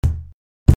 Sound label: cajon bass; creaktor; mozee